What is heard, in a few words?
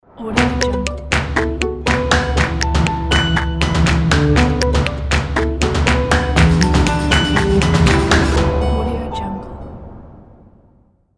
nature rhythmic logo ident